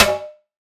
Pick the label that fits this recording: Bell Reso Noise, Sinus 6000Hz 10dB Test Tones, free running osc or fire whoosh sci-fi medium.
Bell Reso Noise